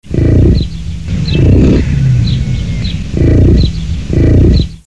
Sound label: bison; bizon; WWF Rangerclub